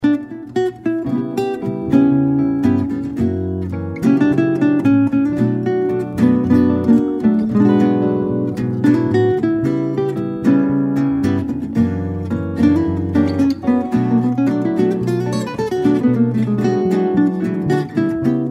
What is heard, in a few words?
Bossa Samba, guitar